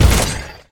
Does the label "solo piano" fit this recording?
No